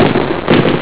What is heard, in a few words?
dragon walk